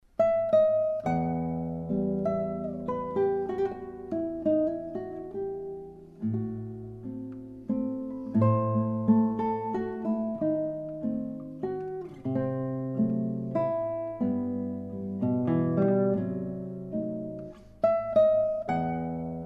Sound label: Solo Guitar